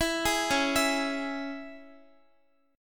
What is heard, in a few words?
C sharp Minor, Guitar; arpeggiated; C#m; x,x,14,13,13,11